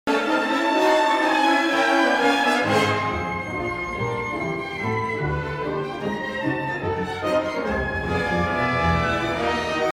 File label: Chamber Symphony